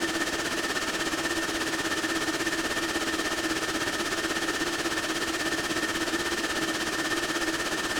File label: jet light; space kitty